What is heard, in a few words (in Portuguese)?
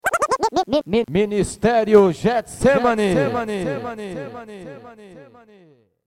vinheta